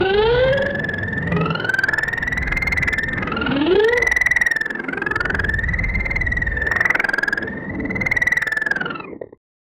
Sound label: dinosaur